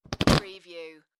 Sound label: Whoopee Farting Blowing Raspberry Sound